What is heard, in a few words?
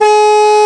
CAR; NFS3 Cars; hrod